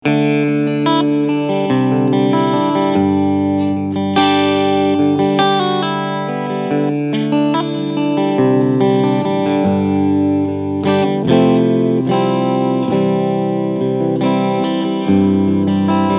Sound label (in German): PRS SE Mira Cleansound beide PUs Singlecoil